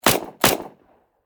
Warfare Submachine Gun Thompson 9Mm Double Shot Distant Perspective; Machine Gun Sound Effects